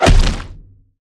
hit enemy; crocs; abominor

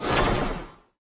basic attack ranged; heroes nyru

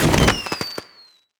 village people fireworks; sfx

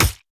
tower dune Sentinels basic Attack

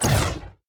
dragons enemy shadow evolved attack; sfx

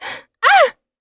Female Elven Archer Death

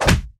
quick feet melee; crocs

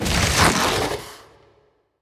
unblinded priest transform spawn; enemy

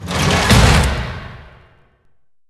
UI gate close

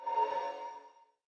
Paladin Heal

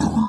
dragons enemy lava tanky death; sfx

